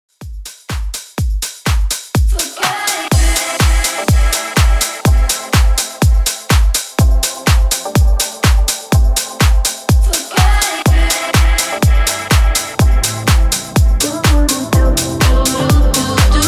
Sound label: MASHUP 124 BPM